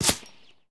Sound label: bullet whiz; Escape from Tarkov